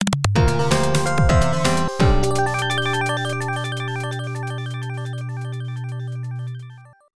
Area Clear; surprise attack; arcade

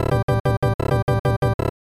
Unused Jingle; Super Double Yakuman; SNES

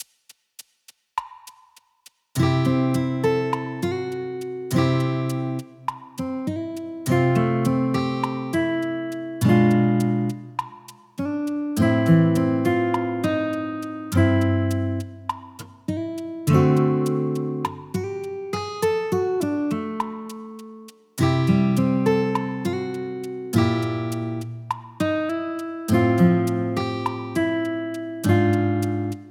Field 1 (Story Mode - Castle Destroyed); super mario maker 2

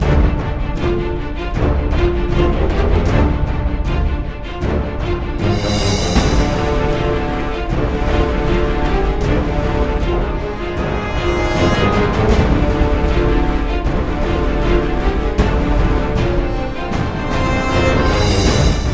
Fight; Ewiger Zorn; Android

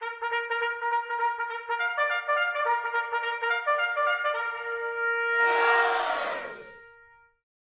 trumpet charge; baseball; R.B.I. Baseball 18; gamerip 2018

